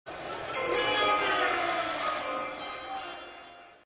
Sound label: chimes; nfl draft